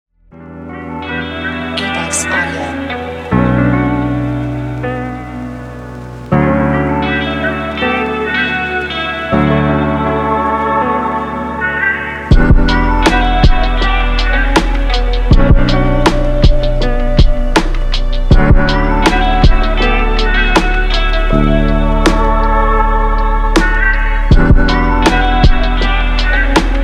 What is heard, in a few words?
Lofi Hiphop; Afterthoughts; keyboards